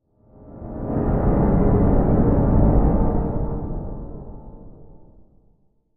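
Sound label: Music Eerie Low Horror, pads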